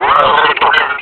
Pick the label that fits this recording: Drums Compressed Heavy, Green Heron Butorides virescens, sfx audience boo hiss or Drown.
Drown